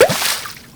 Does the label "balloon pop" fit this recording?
Yes